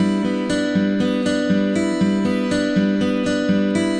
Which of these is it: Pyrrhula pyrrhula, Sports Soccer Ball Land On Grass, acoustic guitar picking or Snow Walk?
acoustic guitar picking